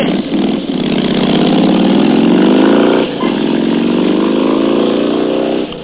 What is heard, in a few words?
start windows; Harley Davidson (90th Anniversary)